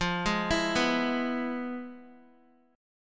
F Minor Major 7th Flat 5th Guitar; FmM7b5 arpeggiated; JGuitar

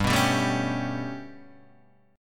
B 7th Suspended 2nd Sharp 5th, chord

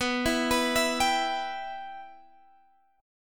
B Suspended 4th Sharp 5th, Guitar, Standard; arpeggiated; capo 3; x,14,14,16,17,15

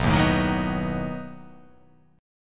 B 7th B down

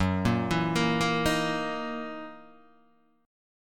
F sharp 13th F sharp down Guitar DADGAD

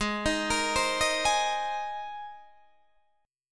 Ab Major Add 9th Ab Guitar Open D

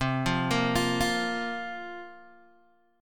C 7th Suspended 4th, Guitar, Open G

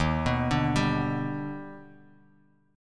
D sharp Augmented Major 7th, Bass Standard; arpeggiated; D#+M7; JGuitar

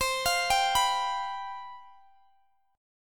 C Major 7th Suspended 4th, Guitar, Standard; arpeggiated; x,x,22,22,20,19; capo=2

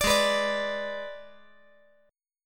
Ab Diminished Ab, 5G, 4C, 5E, 5A

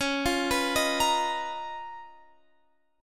C sharp 13th C sharp, Guitar, Standard; arpeggiated; C#13; JGuitar

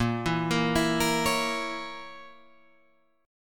Bb Diminished Bb Guitar Open G

